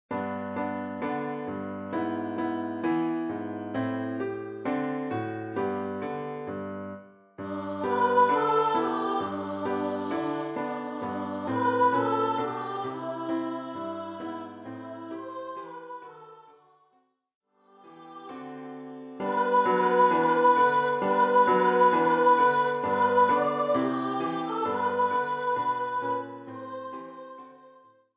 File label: Jingle Bells